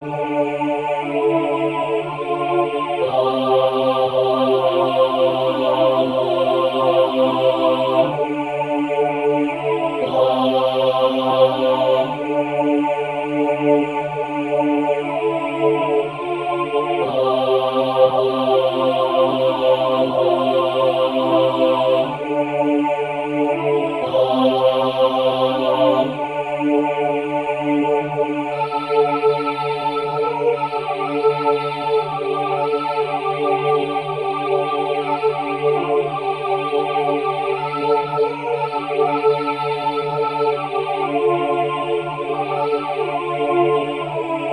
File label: SATB; Blogoslawiona Pelna Milosci